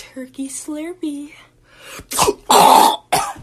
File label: turkey slurpee